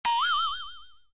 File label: stupid